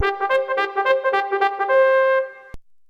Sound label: Bell, Deurbellen